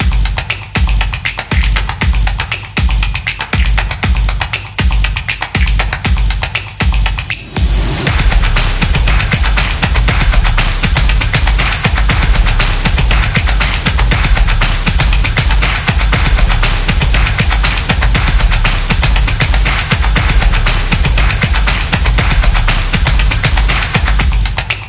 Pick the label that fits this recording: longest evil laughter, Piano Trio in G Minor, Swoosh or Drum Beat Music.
Drum Beat Music